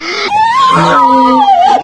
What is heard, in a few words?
pain, zombie poison; Source Engine; video game; npc